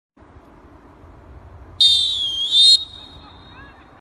silbato; publico

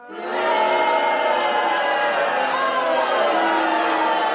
abucheo

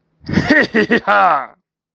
risita

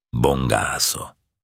bongazo

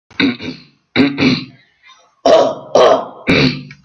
carraspera falsa

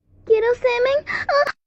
grito anime

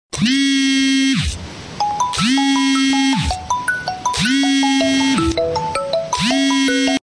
xiaomi tono

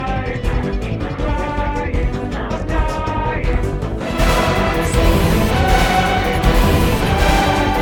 electro coro

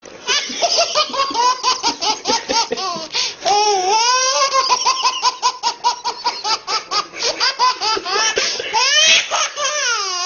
baby risa

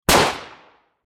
tiro rifle

